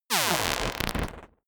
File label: choppy noise short, sciene-fiction